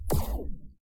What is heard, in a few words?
blaster; Mindustry